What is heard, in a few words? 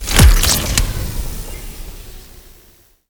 Ice Spell Damage Direct Frostband Strike; Arctic Aura